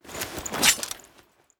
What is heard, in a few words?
axe 1 hand draw; equip